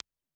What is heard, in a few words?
Altec 670B, IR